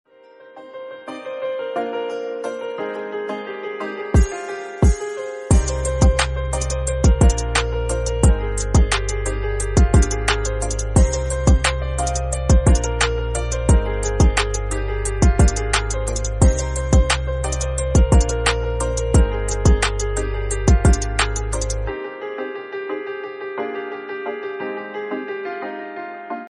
love song instrumental ringtone love music bgm ringtone